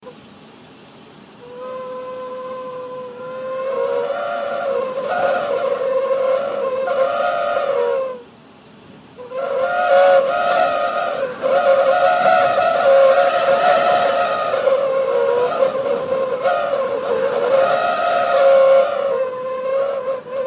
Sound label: wind noise